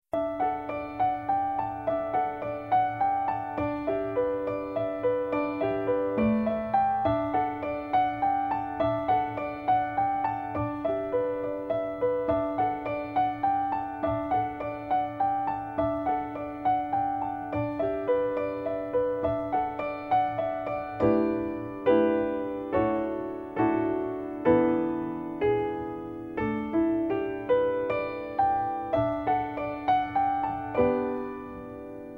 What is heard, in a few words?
longing for spring instrumental